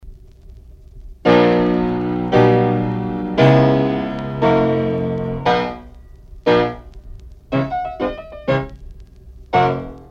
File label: hop step slow